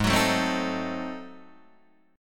G sharp 7th Flat 9th G sharp down Guitar Open D, chord